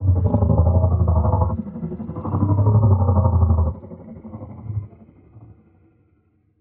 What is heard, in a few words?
HAMMERHEAD idle